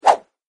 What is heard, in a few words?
attack; mondo